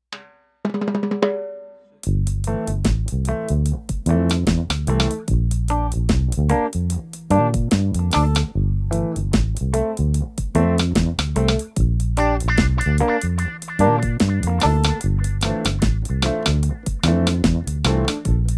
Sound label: DEMO SONG Reggae